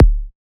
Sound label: Kick D, Psy Kick; Drums; Ghosthack; Ghosthack Advent Calendar 2017 Day 9 - Psy Kicks; Ghosthack 20 Free Psy Kick